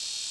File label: World Map Slide